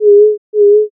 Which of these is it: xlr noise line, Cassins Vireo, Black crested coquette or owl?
owl